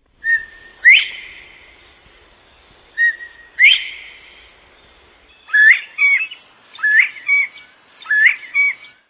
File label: bobwhite